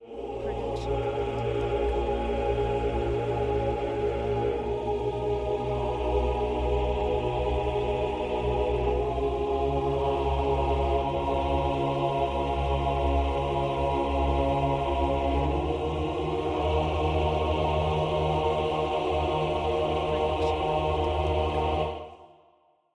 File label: epic choir; soundscrate